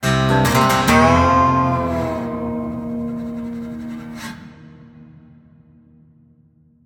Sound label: large Win Riff; dead or alive 2